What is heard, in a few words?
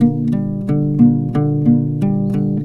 guitar, Jazzmatazz loops